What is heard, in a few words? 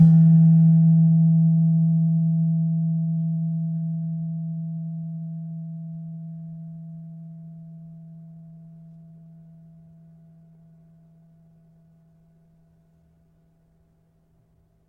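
Slentem E3, gravity harps tuned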